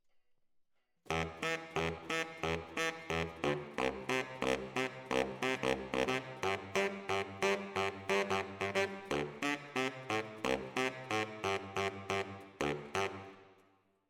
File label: ORTF SCHOEPS, piano